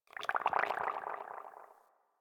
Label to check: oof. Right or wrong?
Wrong